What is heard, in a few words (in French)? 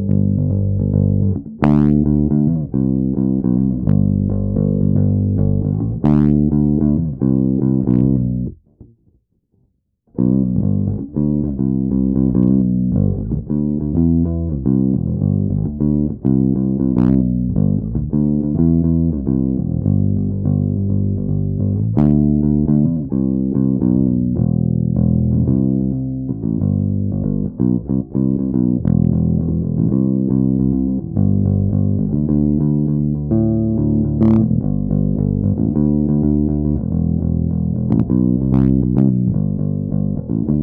Basse; Concert Loix 1 Project